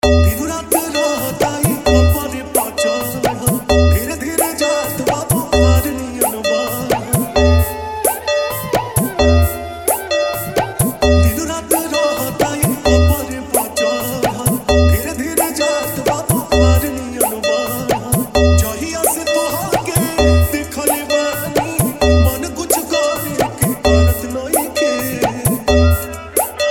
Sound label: New Trending Viral Bhojpuri Dj Remix; Song Hard Dholki Bass Mixx